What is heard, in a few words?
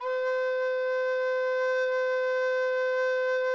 C5B, kobliczek; SoundBytes - Early Patches